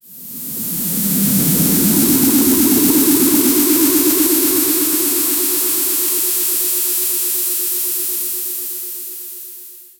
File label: sound effect power, aura